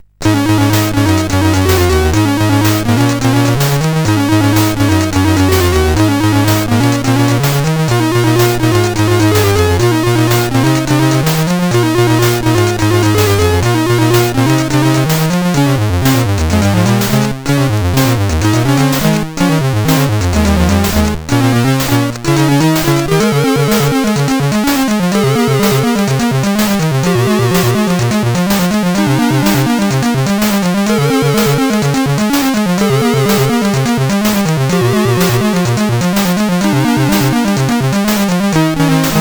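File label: Nebulus, MOS 8580 SID chip music, games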